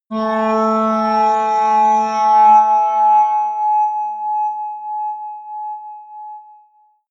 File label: atmospheric feedback synthesizer medium, drones